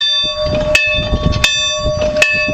antique iron bell

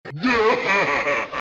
laugh; dingodile

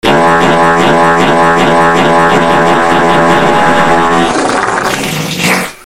fart

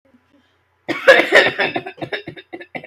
cough music

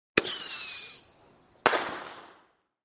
high bottle rocket explodes

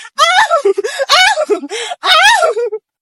aaaauuu

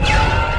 explosion; jedi interceptor; lego star wars

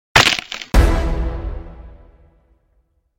neck snap kill sound; valorant

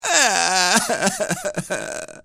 funny male cry

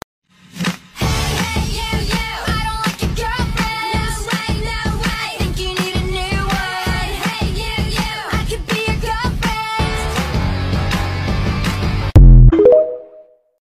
fat cat dancing

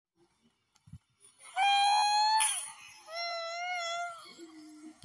hee hee slowed

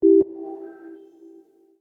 chime; disco elysium

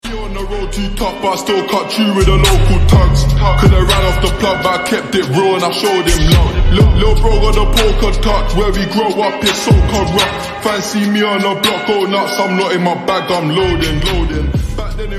usa rap

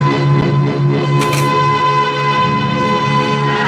random car horn

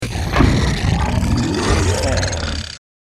robo snarl

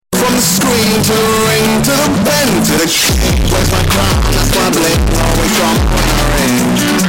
bass boosted

